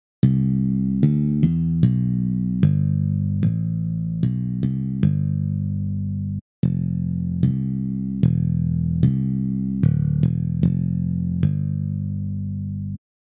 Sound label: bass only